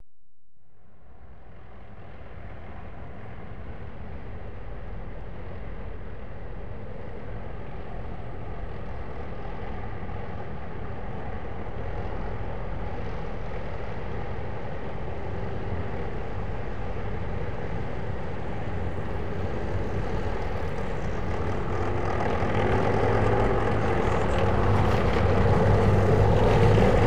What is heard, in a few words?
hangar tanks passby; War Thunder